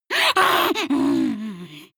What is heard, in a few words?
witch snarl aggressive; kreaturen